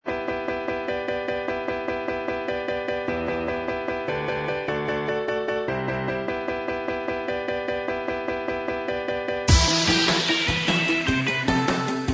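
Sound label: instrumental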